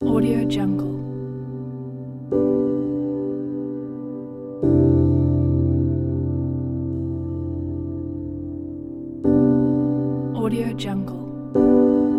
slow relax piano kit

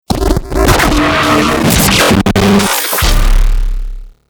digital glitch chaos

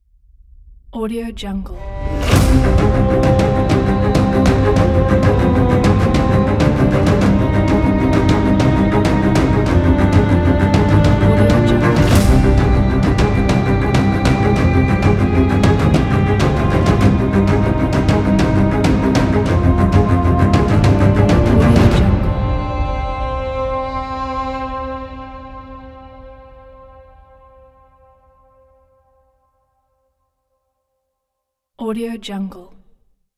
epic medieval trailer

